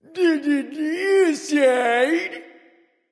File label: Alert Notify Kill All; Smite; Super Chill